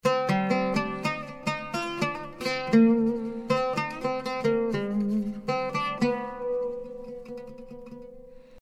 Short Oud

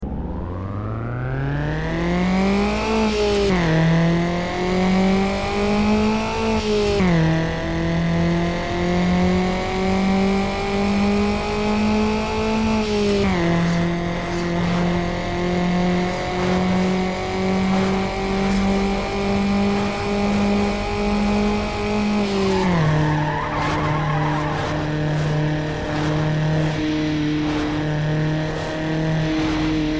Car engine; NFSU

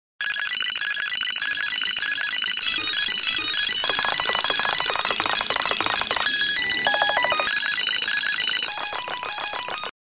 Digital rigtone

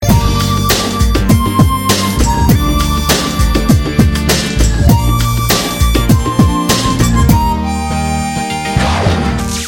Harmonica

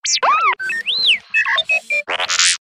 R2d2 Sound

